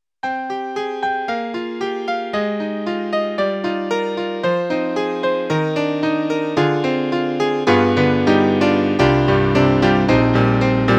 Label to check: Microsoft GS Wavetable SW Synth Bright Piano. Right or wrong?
Right